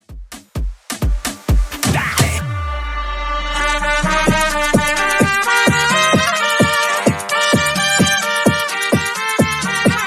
Rumba Trompeta 129 BPM; Mash up; ReBuild

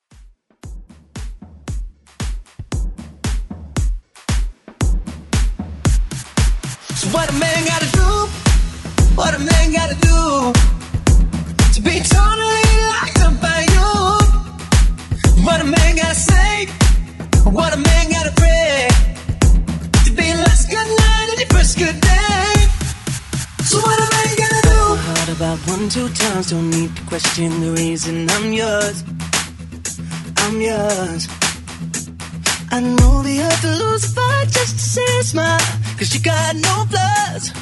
ReDrum 115 BPM

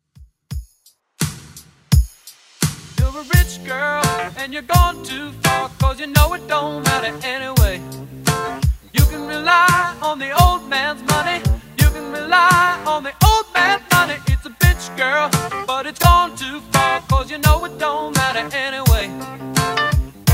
80s Redrum 85bpm

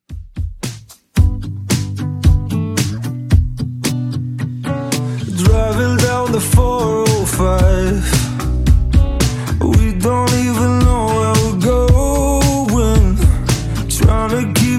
Top40 Redrum 112bpm

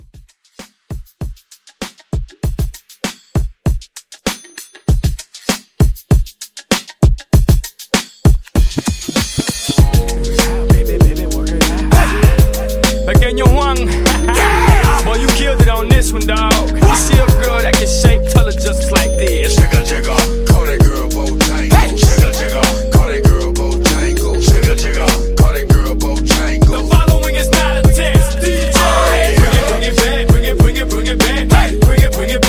Hiphop Hybrid Mashup

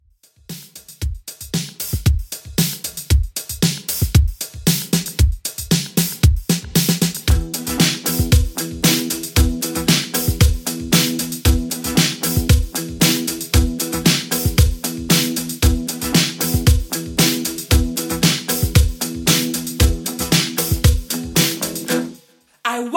80s Redrum 115 Bpm

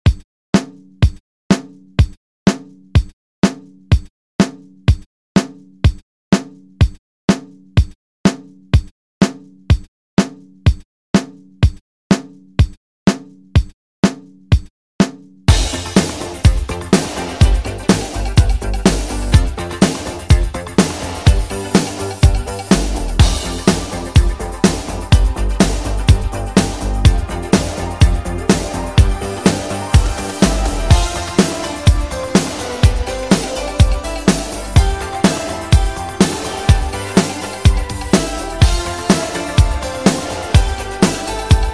80s Redrum, 124.5 Bpm

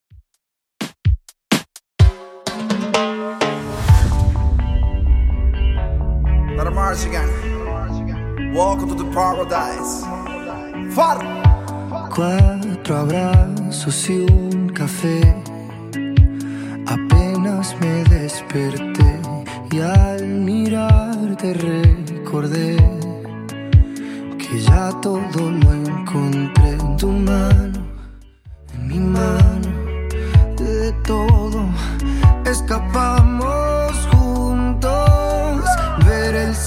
Reggaeton DJ Friendly 127bpm

